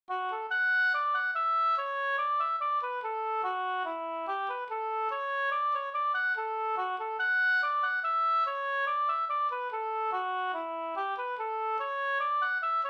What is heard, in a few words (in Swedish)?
engelska; fr Nynashamn